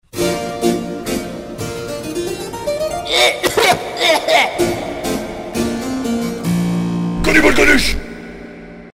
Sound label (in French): clavecin jingle